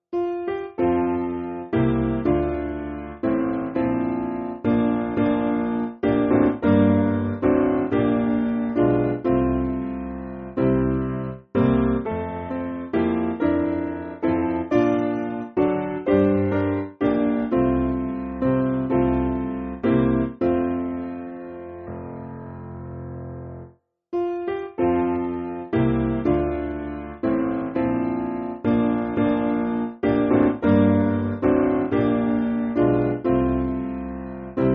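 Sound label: Piano, Free Music Backing Files for Churches; Begin My Tongue; Manoah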